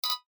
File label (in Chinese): 芒果音效